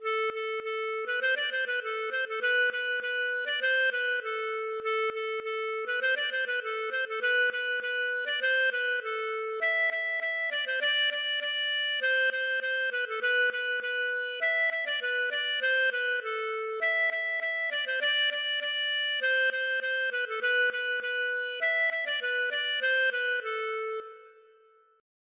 andro Folk

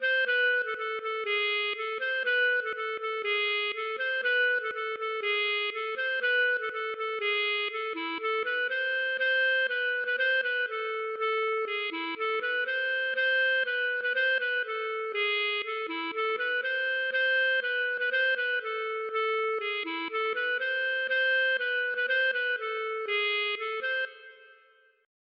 laride

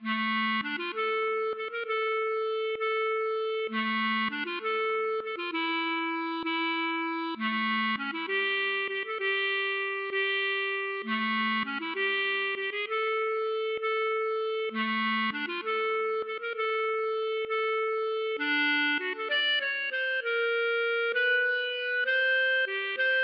valse

